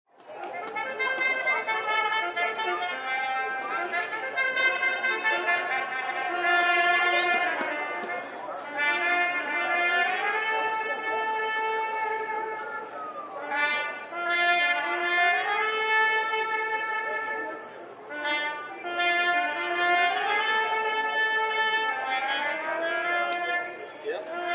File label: Ambience of horse racing crowd, outdoors as a horse race is happening and play by pla; Ambience of horse racing crowd, outdoors as a horse race is happening and play by play was being annouced, recorded in front of the gate; Crowds; Backgrounds